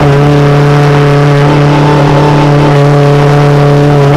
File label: i4 petrol small, engines; acf engines